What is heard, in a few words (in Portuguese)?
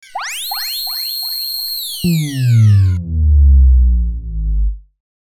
vinheta explode